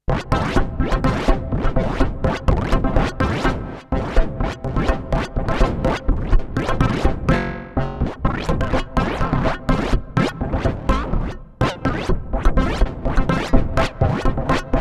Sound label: Novation Summit KarplusStrong Mod Experiment